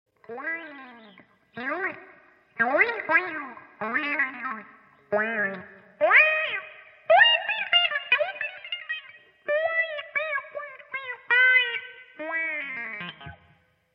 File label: wah